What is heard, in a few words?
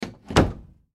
Door Close Pantry, Door Close Pantry Wood; Household; Miscellaneous Doors; Sound Effects:Doors